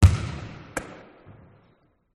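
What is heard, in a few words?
BBall Bounce Catch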